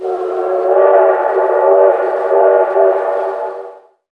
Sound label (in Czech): parni piskani; Mafie 1